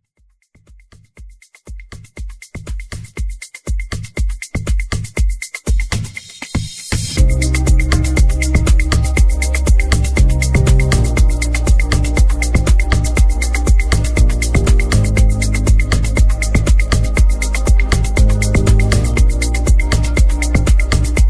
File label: Vocal House